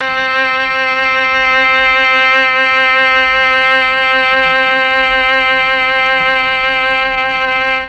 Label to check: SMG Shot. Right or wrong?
Wrong